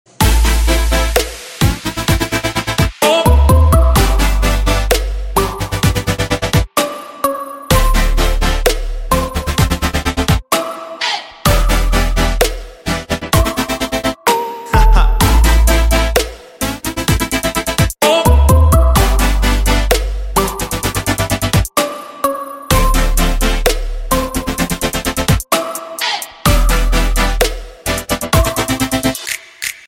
EDM; nhac khong loi

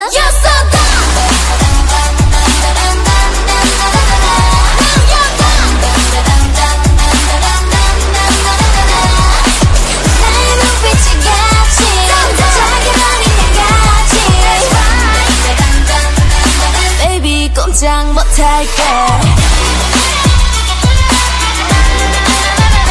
kpop

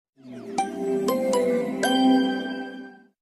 samsung s6 turn on

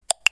samsung click notification

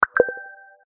samsung moon drop

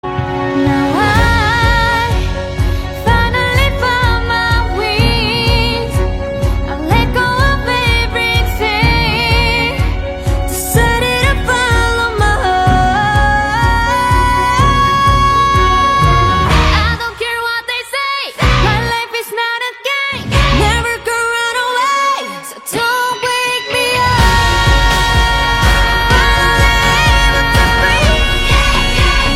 ringtone

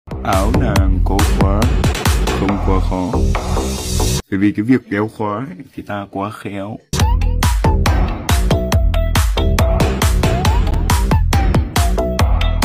remix

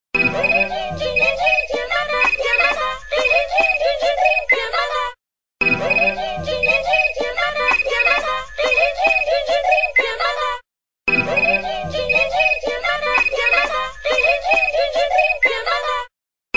ringtone; pokemon; tiktok

